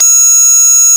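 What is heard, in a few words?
Guitar Harmonics C5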